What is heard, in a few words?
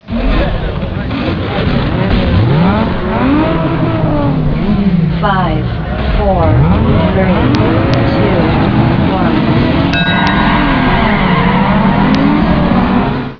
race; jb nuke csbr